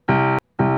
C2 アタマ, 打弦; 弦鳴楽器

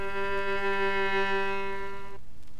ヴァイオリン時間逆転音 G3; 持続音

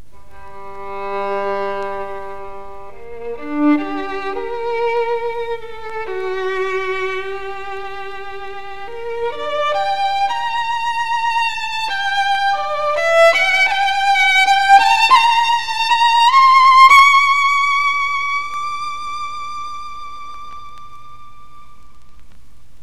Guarneri, 擦弦; 弦鳴楽器

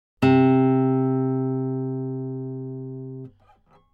C3 on A 爪弾き, 撥弦; 弦鳴楽器; アルアイレ

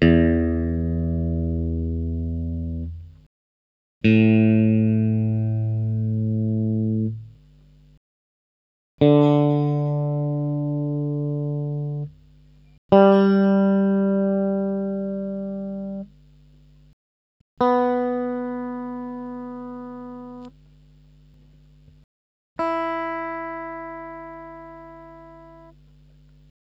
エレキ・ギター; 電鳴楽器; 開放弦(指・ピ)

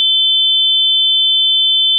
正弦波（定常）2本, 仮想ピッチ; 音の高さ

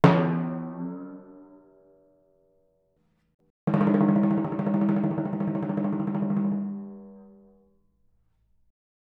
Timpani 奏, 膜鳴楽器 打奏; Timpani-奏法と打叩強度による違

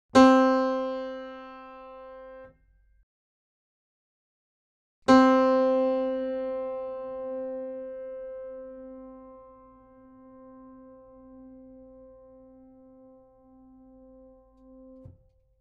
C4, 打弦; 弦鳴楽器

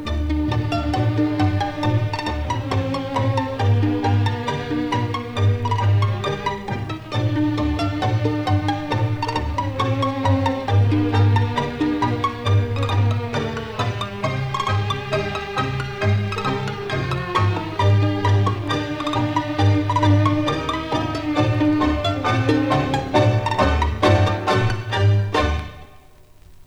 Pizzicato, 擦弦; 弦鳴楽器

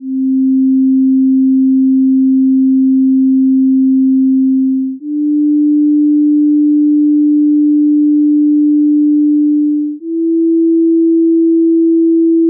正弦波1本による音階; 付録D：音階・音律; 各種音律

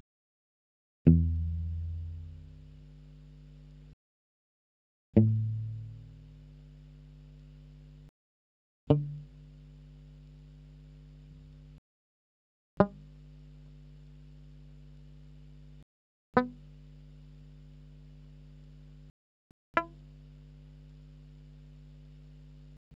エレキ・ギター; 電鳴楽器; パームミュート

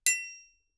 トライアングル; 体鳴楽器; 打奏

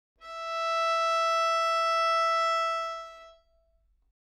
ヴァイオリン, 擦弦; 弦鳴楽器; 開放

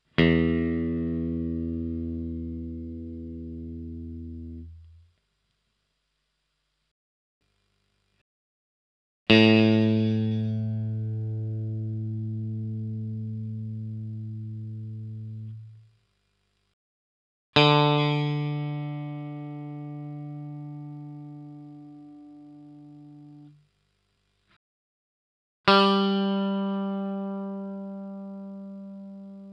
エレキ・ギター; 電鳴楽器; 開放弦(指・ピ)

